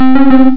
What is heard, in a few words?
Slow light switch clicking with "downward tone" (short "off" notification); CheckBox not